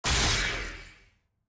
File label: skill hit; shaco